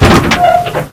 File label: door metal open